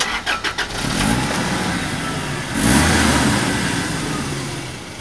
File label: car run away